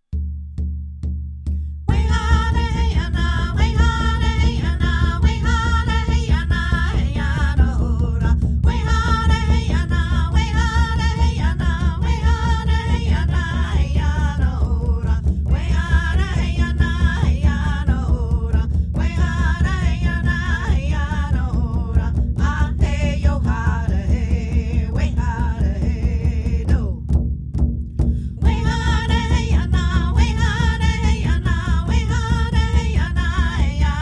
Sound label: SUNDANCE CHANT